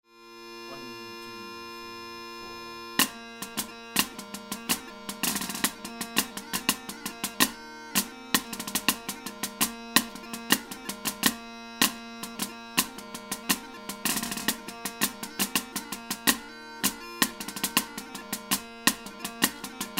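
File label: Ewe Crooked Horn Elementary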